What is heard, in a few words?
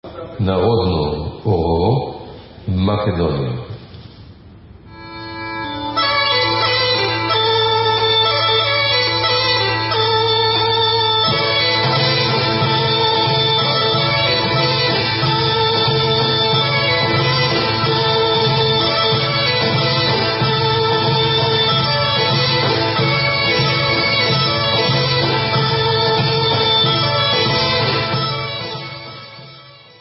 Narodno Horo, Bulgarian Instrumental